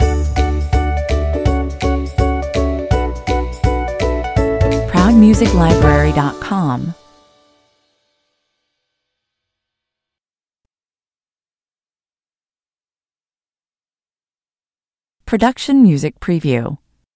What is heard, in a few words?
happy explainer stinger